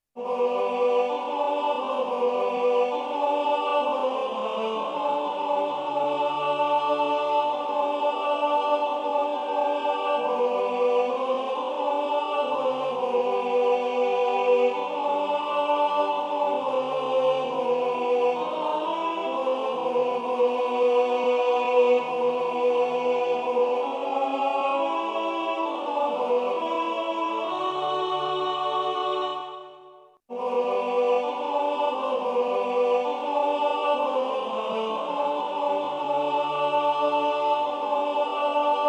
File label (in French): canticorum tenor; Chants